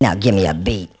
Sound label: TECH KIT, RAVE; Rubber Chicken Software Sample Bank